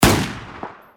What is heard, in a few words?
Rifle